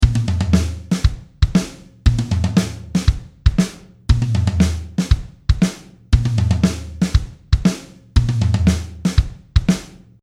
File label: Motif